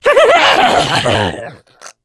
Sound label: jockey death, voice; Left 4 Dead 2